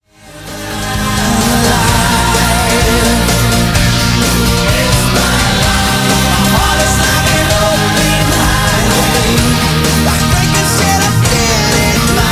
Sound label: Bootlegz 128bpm